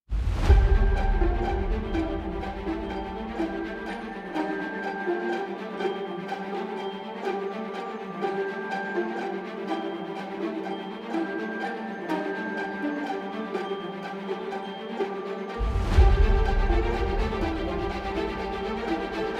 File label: Instrumental